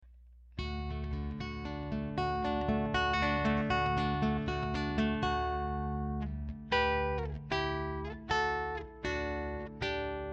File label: takamine nylon